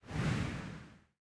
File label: slide; Smite; god Medusa v2